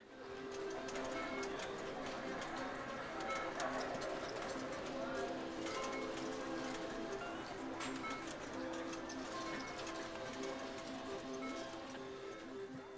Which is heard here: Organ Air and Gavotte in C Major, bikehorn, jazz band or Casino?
Casino